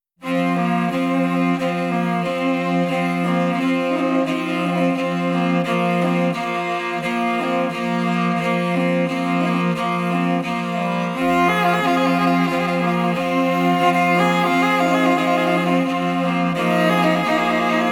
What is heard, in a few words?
interlude; folk metal